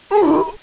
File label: death; cstrike; codlife